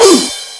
normal hit whistle; osu

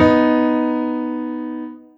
Two B3 D#4; osu

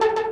synth; osu

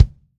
kick; osu; adofai

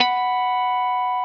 N.H.

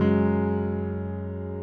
piano low

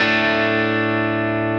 x 3 2 x 0 Asus4

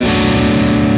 Bbm7; osu

